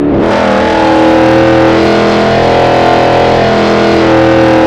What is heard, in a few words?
gear, vehicles; hsvgts; tdmcars